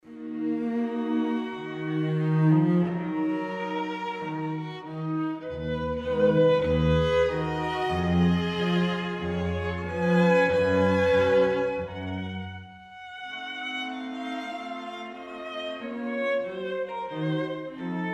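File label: quartet; viotti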